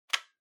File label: Portable MD Controller Unplug